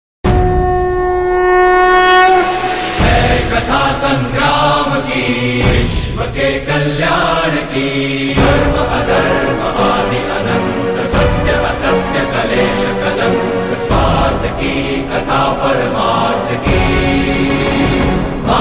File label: flute; mahabharat star plus bgm